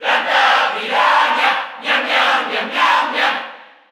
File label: Cheer Spanish PAL; Piranha Plant; SSBU